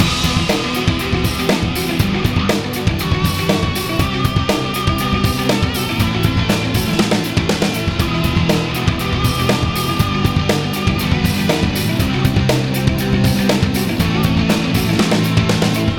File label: Music Danger